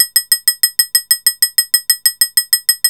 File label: LOOP F; AMG - Vince Clarke Lucky Bastard